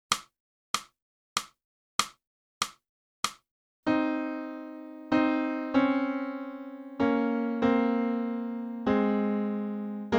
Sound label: sax Track